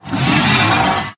Vault Buttons Down

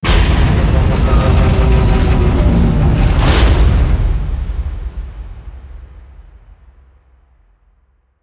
Airlock Close

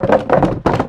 Wood Roll; moul

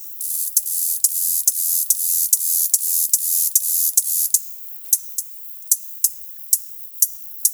Chasm Cricket Loop

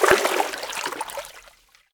Deep Water Walk

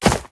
Grass Run

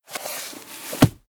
Book Close

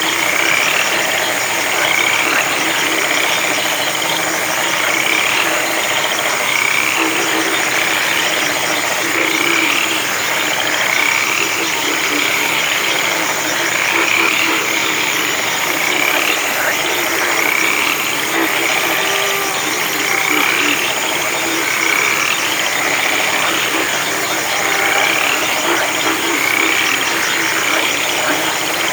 Swamp Amb Mix Loop; moul-assets

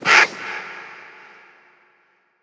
Desert Vulture

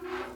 mini creak; Mirtahm

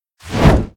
Flapping; Bahro

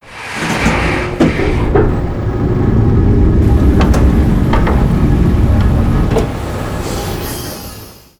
Chair Eject; Vog

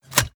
Periscope Button Dn; moul

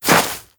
Grass Walk

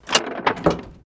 Lever Post Drain

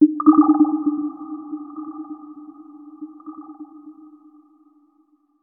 Forest Amb

